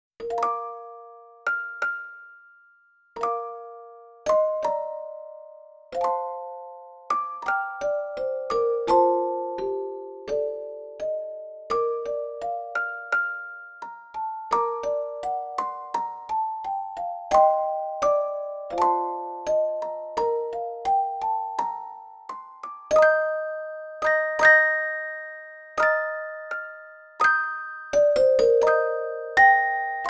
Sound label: Orgel arrange ver.